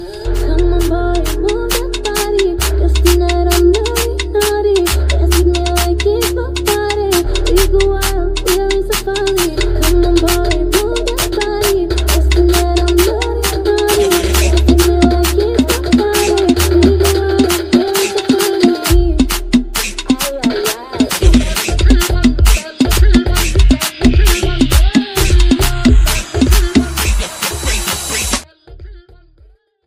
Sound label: ringtone BGM